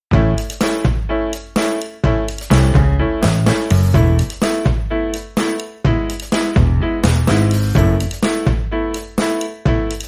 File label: No Melody Melotron and Less Guitar and Synths